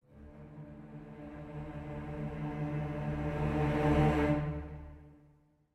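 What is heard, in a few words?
Pre Orch Ens 6 Celli 4 Double Basses 8va Trill Half Tone; Audio Imperia Areia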